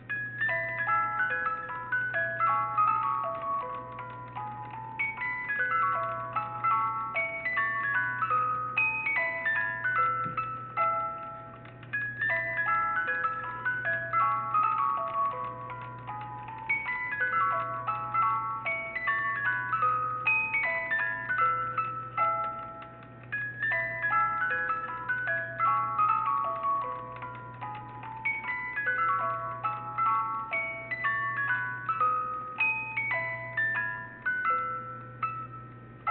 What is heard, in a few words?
Music Box